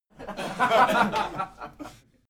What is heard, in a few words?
laugh male short